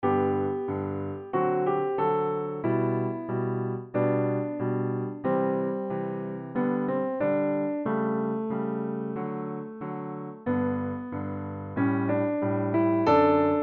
pomp and circumstance piano

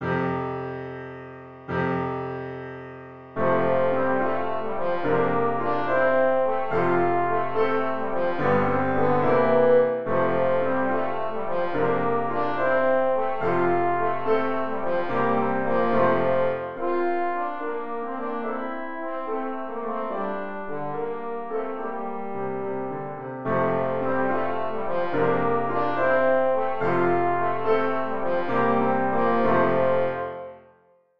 trombone; Beginner Trombone

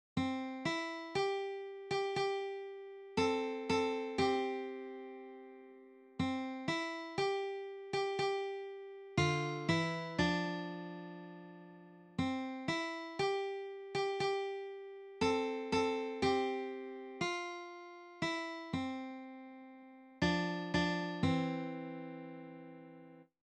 guitar

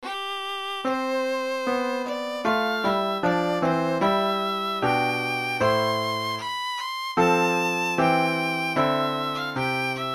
minstral boy violin solo; the minstrel boy

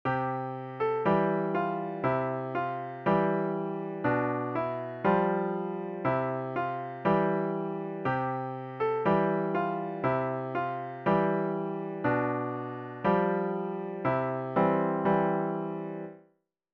london bridge piano solo

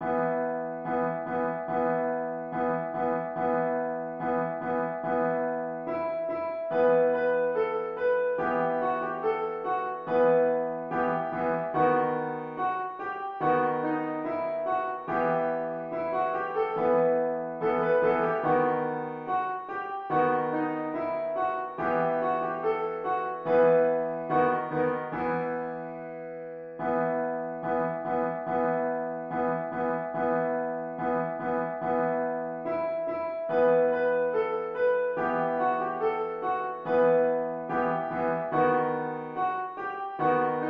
piano

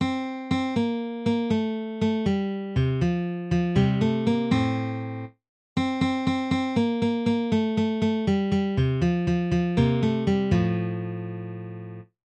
doctor foster guitar

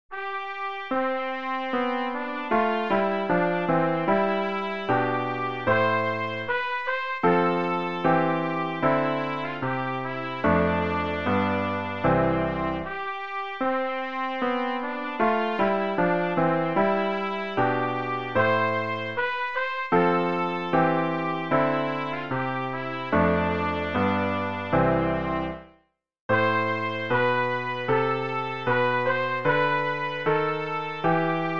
minstrel boy trumpet solo

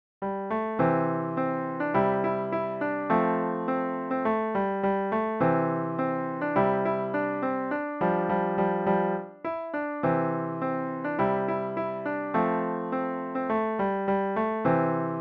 peace like a river piano; Easy Piano; I've Got Peace Like a River

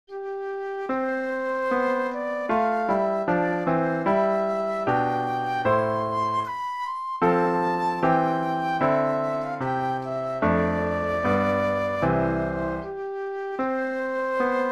flute solo

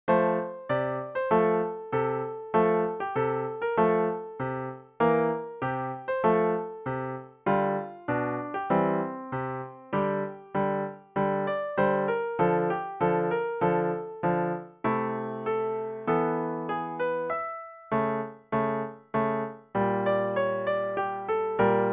piano solo

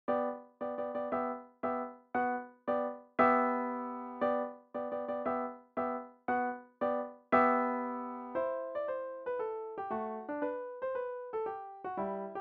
march piano solo; nutcracker

